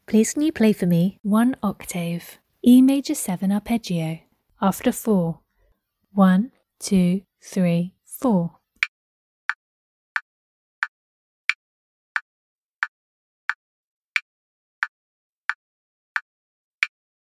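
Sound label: E major 7 arpeggio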